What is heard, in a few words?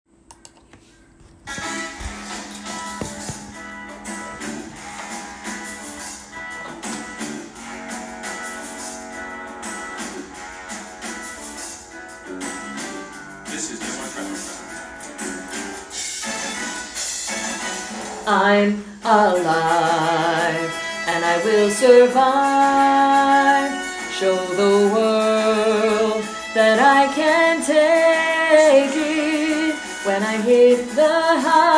Melody + Tenor; Fame The Musical - Holiday Pack